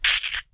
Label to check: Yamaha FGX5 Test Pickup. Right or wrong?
Wrong